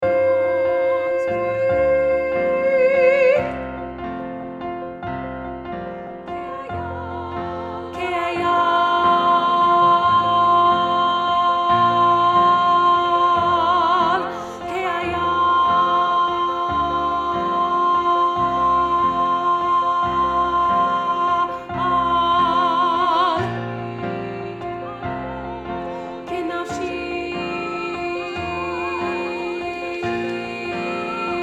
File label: Soprano 2; Treble Choir Middle-High